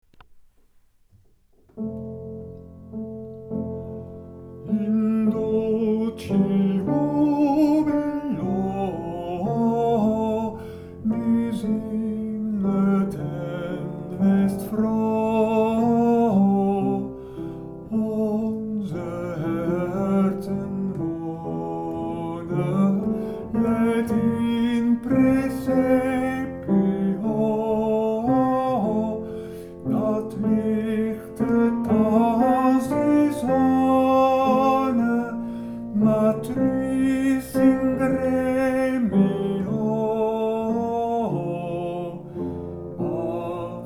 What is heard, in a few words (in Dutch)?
ten in dulci, oefenbestanden